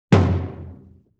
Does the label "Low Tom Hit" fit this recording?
Yes